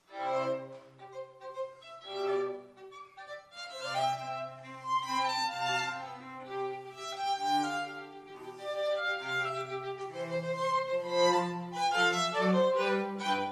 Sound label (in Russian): маленькая ночная музыка; Моцарт